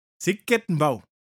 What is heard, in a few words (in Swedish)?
bip